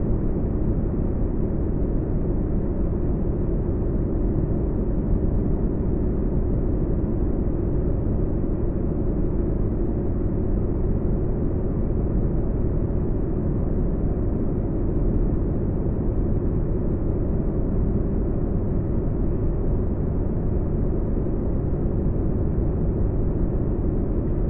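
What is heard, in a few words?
ambience elevator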